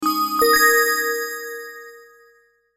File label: chime logo, Chime Logo Bright Bell Chord Ascend; Chime Logo Bright Bell Chord Ascend Production Element Imaging Element Accent Transition; Multimedia; Chimes; Blastwave